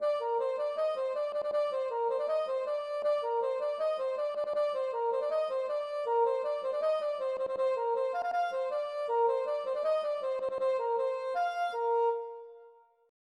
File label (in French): passepied